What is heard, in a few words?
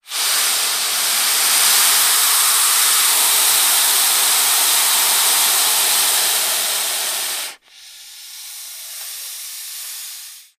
Python Burmese Hiss